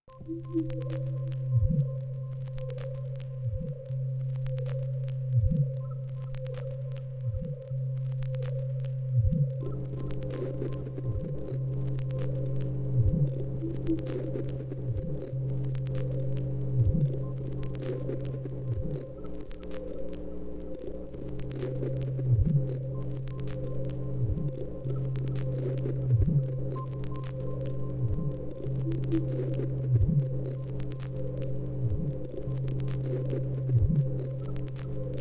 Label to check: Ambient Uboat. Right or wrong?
Right